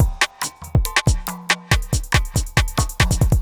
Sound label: ind 140 kit